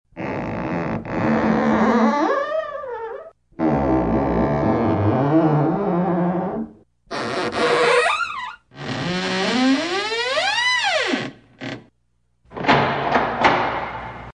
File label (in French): Porte grince